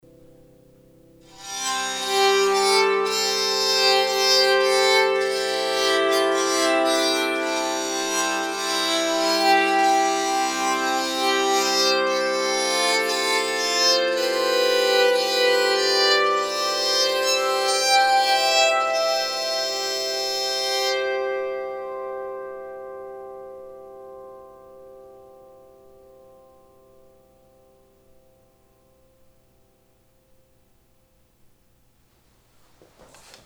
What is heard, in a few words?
aspen baritone tune, bowed psaltery